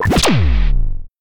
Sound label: impact